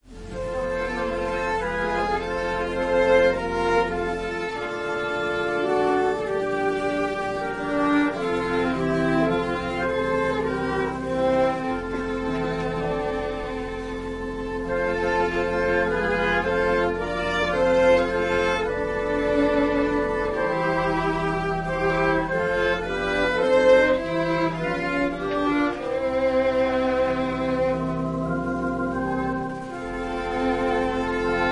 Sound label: Special music- instrumental